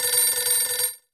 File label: Ring